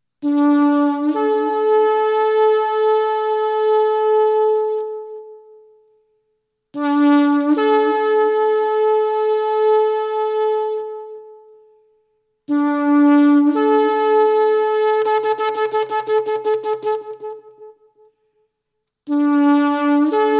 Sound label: Shofar